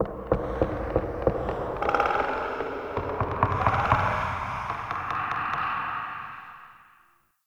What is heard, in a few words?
Root Cave Trippy Knock; Ambience; One Offs; Neathuns Root Cave